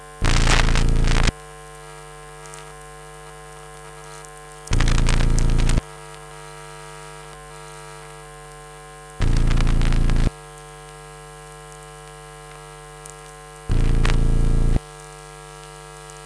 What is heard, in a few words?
noise